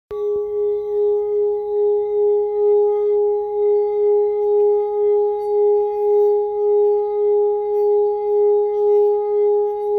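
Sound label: Blue G, singing bowl; Flower of Life Purple Rounded Meditation Singing Bowl – 8″D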